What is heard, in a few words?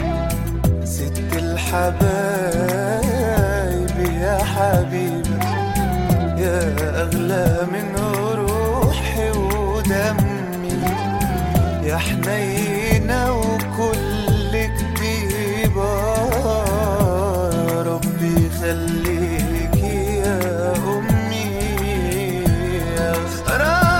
Arabic Mother Calling Ringtone